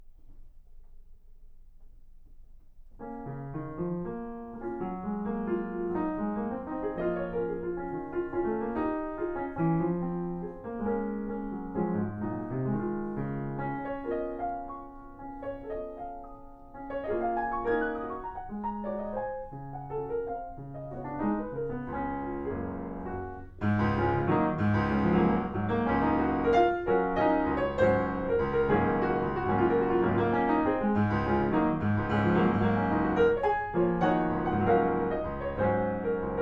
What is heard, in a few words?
mazurka, pianist; alexander scriabin